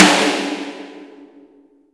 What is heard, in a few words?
NCSNC2RFAL, drums; Best Service; Real Mega Drums VOL-1